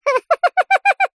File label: cartoon character cute high pitched giggle laugh short